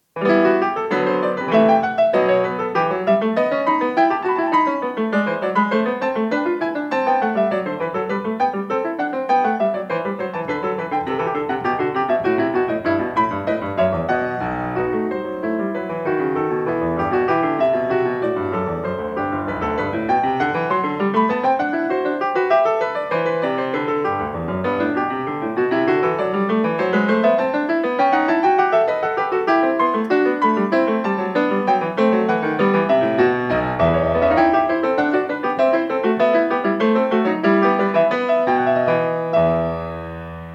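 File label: Air, piano; Partita 6 in e; BWV830; BACH; PARTITAS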